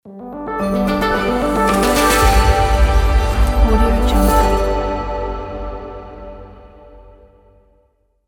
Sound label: branding digital logo